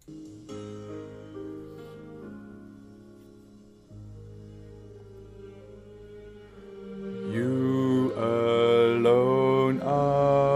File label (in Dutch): sopraan 2; Agnus Dei